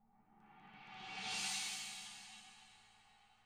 CHN SWEL, CHINA SWELL; ILIO; Double Platinum Drums 1

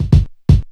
DRUMLOOP, Ragga & Dub Reggae

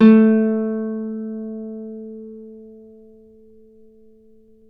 Celtic Harp, Plucked Strings

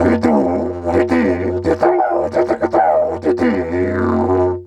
F#3 WILD, Didgeridoo; Big Fish Audio - Didgeridoo and Other Primitive Instruments

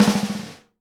SNARE RUFF, Live Snares; PlatinumPhattCD1; E-MU Producer Series Vol. 8 – Platinum Phatt

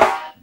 SD, snares; EastWest; Dance Mega Hip Hop Electro

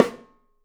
Studio Snare; E-MU Producer Series Vol. 5 – 3-D Audio Collection; 3DPercussives

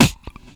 VOC DRUM, MOUTH PERC; Best Service Hyper Dance

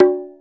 Quinto Open; Planet Earth; Digital Sound Factory Planet Earth

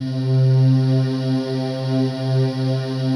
PAD, DIGI-PADS

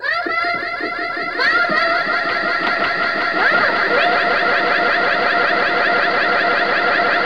VOX FX; Big Beat Samples & More

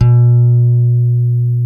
Bass, LATIN, C 4; Spectrasonics; Bass Legends; ROUND LATIN

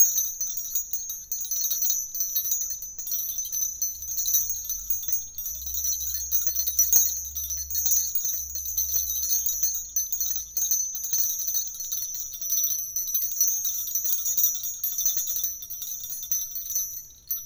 Fast Bell Loop

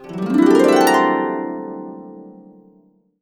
C, Harps; USB Soundscan vol.62; Complete Harps; AKAI

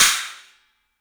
CYMBALS; 3D Sound; 3D Drums

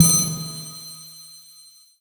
Ethnic Hit, One Shot Drums

